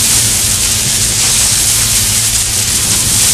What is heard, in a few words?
laser beam green; vfx; portal2; Source Engine